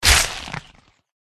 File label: attack contact middle; valdagor